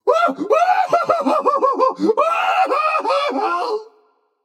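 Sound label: nonverbal fear; smite; voicepack; merlin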